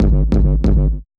Bass Kicks G, Grime, 140bpm; Zero-G Sound Sense Grime Scene; GS Construction Kits; Just Flex

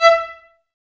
VLS E, Violas, Detache; Miroslav Vitous

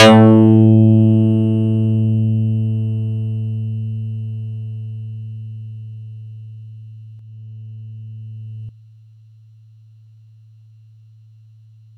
Yamaha YX81Z Elec Bass a3

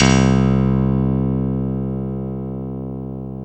KEY MKS P3, P3 + Rhodes; Roland L-CD701; Pop Pianos 3

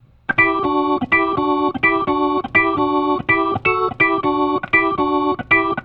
Soul Organ Riff, 70s Analog Funk; Sonic Foundry (Sony Creative Software); What it Is!

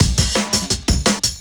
Beat Style, 170bpm, breaks

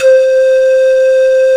M1 PANFL; Best Service; Alpine Volksmusik; BRASS+BASS

